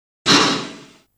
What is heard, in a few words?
Train Chuff Generic, steam train